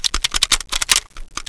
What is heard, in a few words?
widow lookat loop, knife; weapons; cstrike; csgo